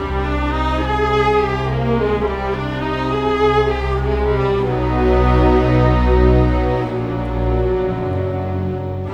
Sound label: Cinematic Strings, Orchestral; Sonic Foundry (Sony Creative Software); Orchestral Series VOL-3 - Cinematic